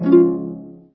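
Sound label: harp joyful chord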